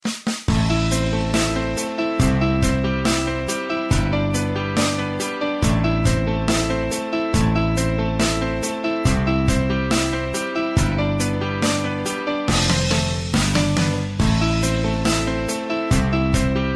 Light Ringtone; زنگ خور ملایم آرام دلنشین زنگخور آرامش دهنده ساده شیک

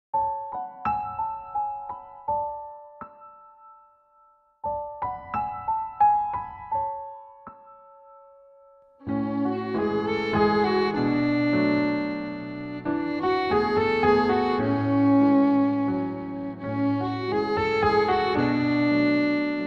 Violin

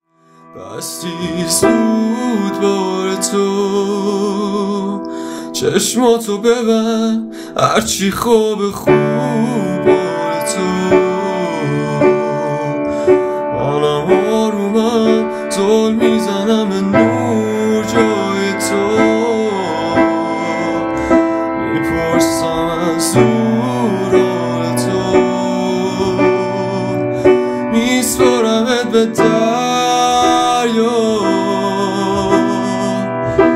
Piano

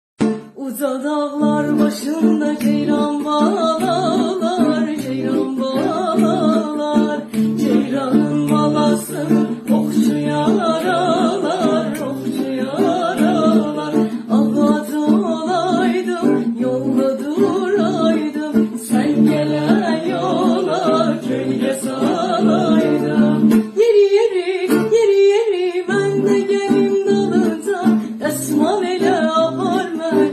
Guitar

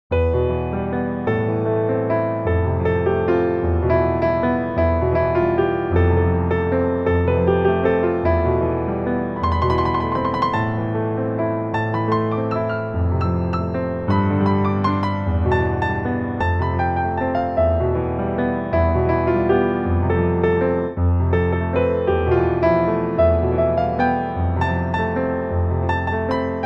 Piano

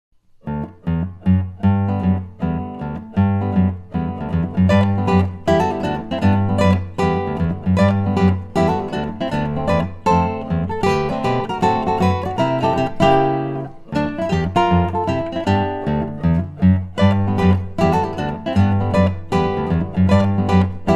Guitar

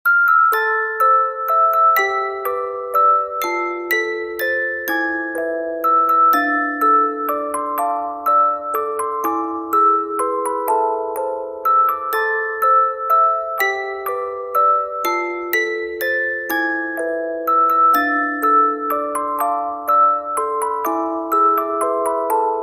Secret Music Box

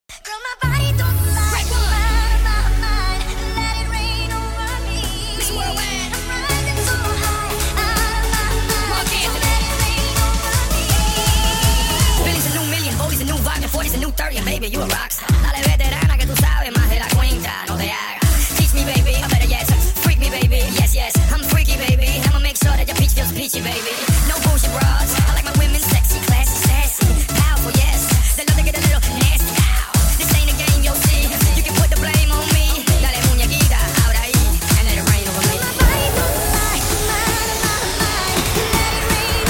HARDSTYLE REMIX

